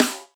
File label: snare